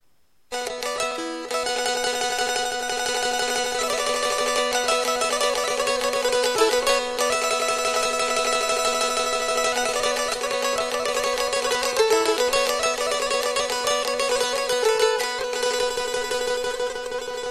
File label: Ciftelia